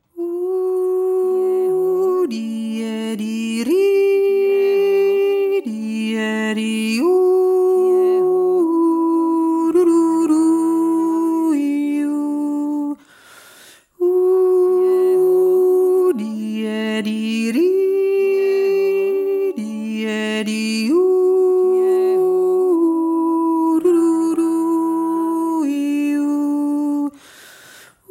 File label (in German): 1 stimmme